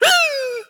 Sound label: Zombie Scared